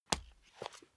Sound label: step barefoot wood parquet single right; schritte